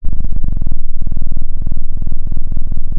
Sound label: pop, Sound of a cat purring